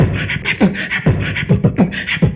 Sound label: Beatbox, Drum loops